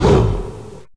death evil plant; Viking Escape

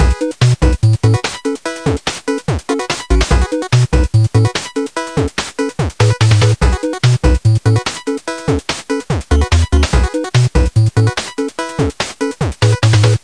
king of coins short; Virtual Boy Escape

